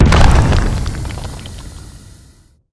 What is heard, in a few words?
cannon dead, monster